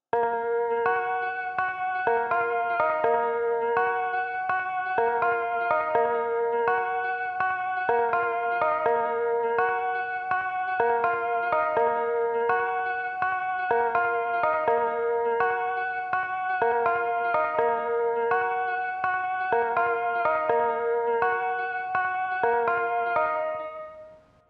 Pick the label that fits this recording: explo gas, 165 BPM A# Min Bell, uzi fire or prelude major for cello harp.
165 BPM A# Min Bell